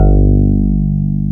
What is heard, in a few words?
TX 802